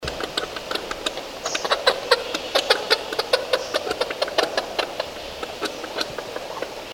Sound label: Oilbird